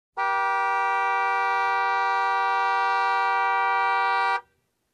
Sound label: Car Horn long honk